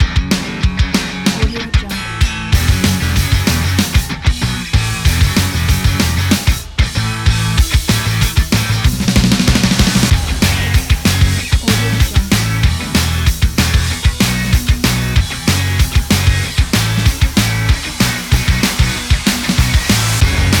action energetic indie sport rock

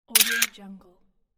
camera shutter

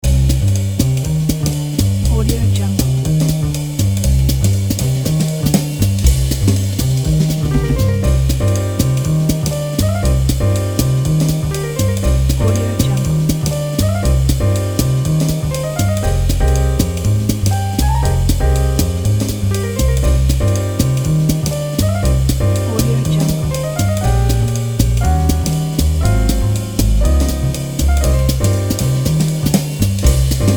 the jazz; eightballaudio

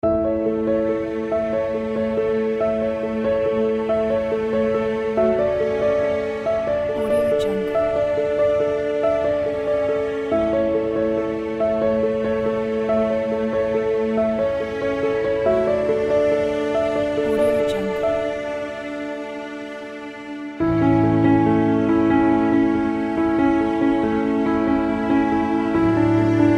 cool day piano cello background

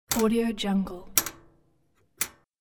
small metal clings

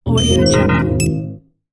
scifi hud

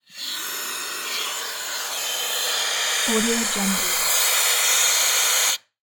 fire fighter mask

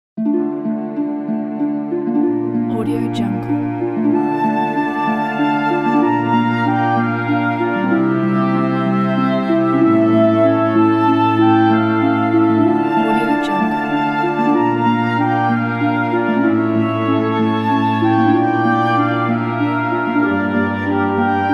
in baroque style

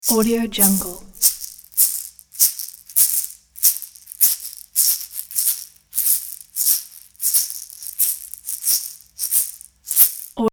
shaker slow

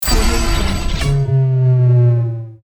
trailer transition

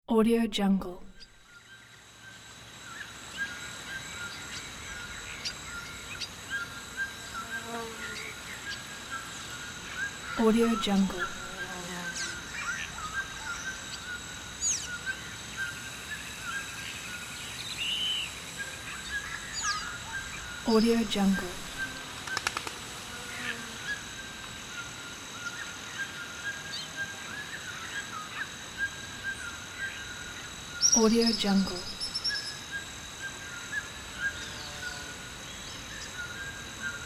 savannah

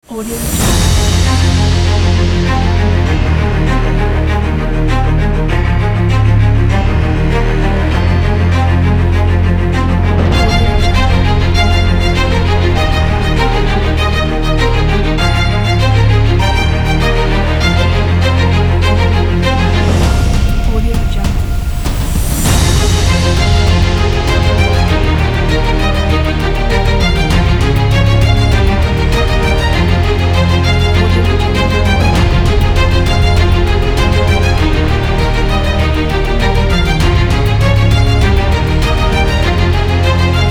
an epic cinematic trailer

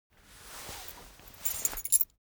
cloth key ring small trousers pocket fetch; foley; smartsoundfx

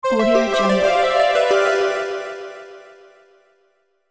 christmas holiday notification

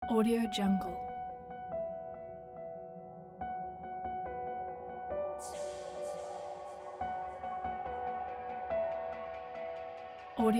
motivational ambient trailer

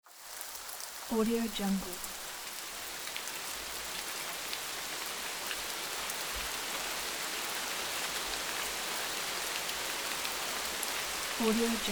rain

